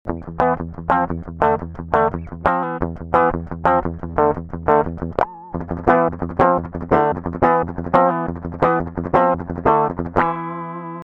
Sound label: Metal 175bpm